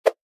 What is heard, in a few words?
Cartoon whoosh